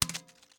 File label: drag to trash; AppCleaner; MAC Software